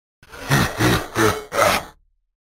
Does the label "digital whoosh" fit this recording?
No